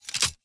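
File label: Reload Belt Movement; Valorant; odin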